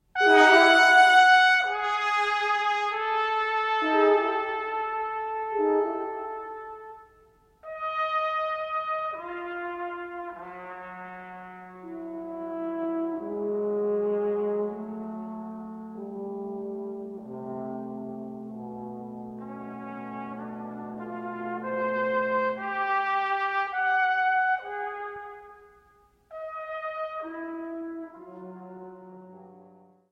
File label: Brass Trio Slow Fast